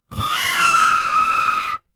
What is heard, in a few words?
mechanical tire screech